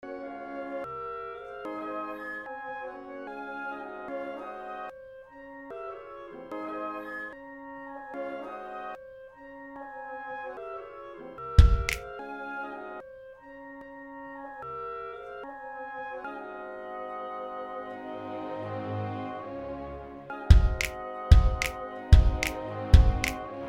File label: instrumental; 2006 glitch instrumentals